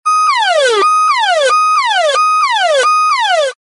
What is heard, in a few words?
alarm personal siren